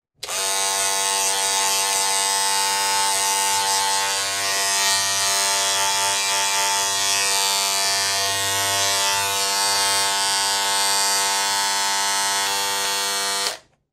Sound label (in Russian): zvuk mashynki dlya strijki